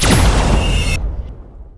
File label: laser; weapons